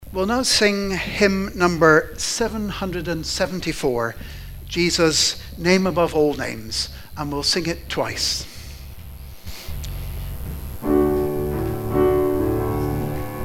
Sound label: hymn